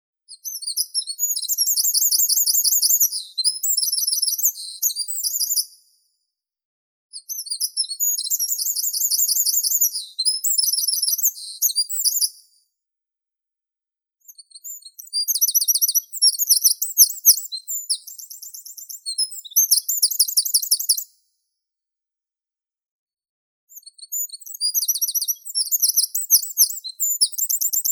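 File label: wren, Troglodytes troglodytes; krapivnik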